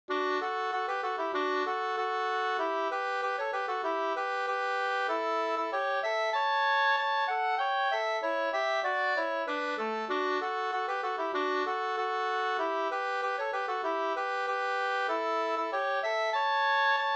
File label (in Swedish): snoa